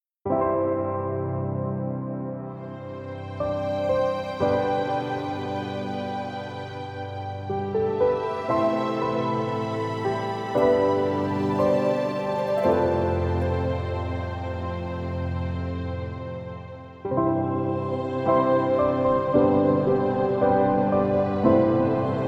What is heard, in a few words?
Instrumental Moments